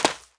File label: HITSAND; Jack